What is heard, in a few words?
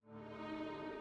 mood intro tragic